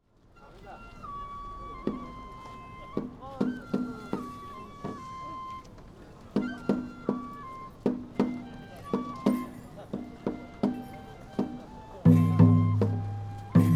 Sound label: ekimae kagura, Fukushima